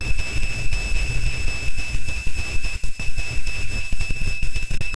computer sounds noise sta

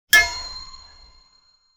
videogame fps kill sound

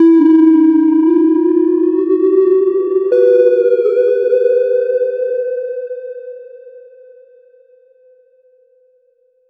c5 to g4; round

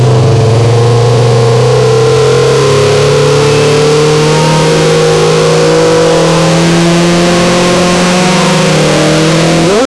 a formula one race car; SFX Engine

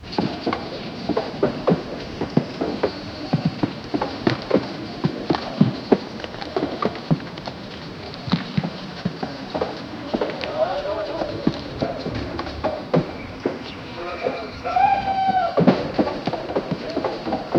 city ambience in a small, town

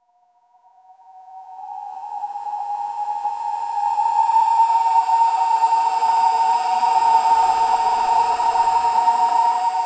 whispery dissolve gentle

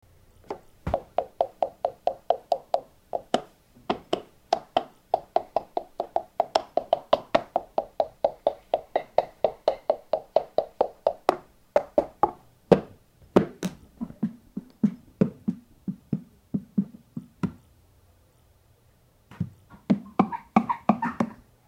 in a big house kitchen, clip clop

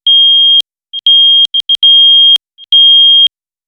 warning beeps system inter, interface

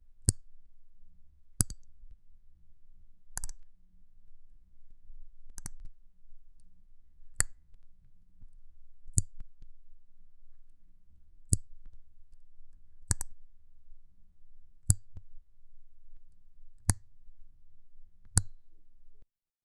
very slow soft press of, analog

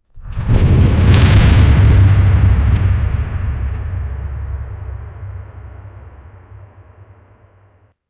thunderous cinematic soun; thunderous cinematic sound; treasure

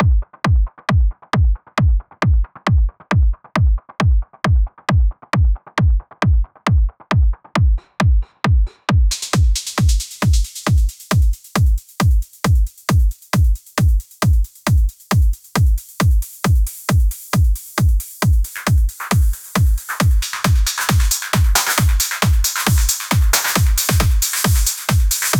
psychedelic trance kicks

